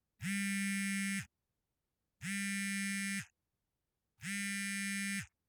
Mobile Phone Iphone Smartphone Ringtone Vibrate Mode